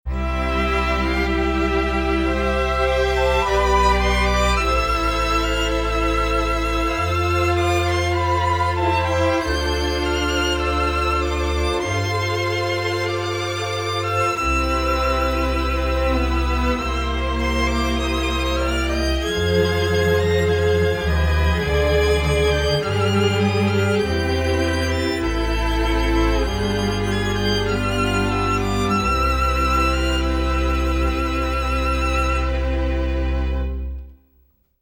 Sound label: heartbreak violin